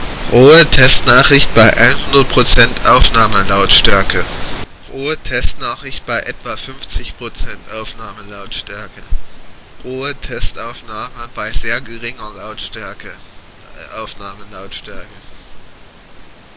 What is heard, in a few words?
noize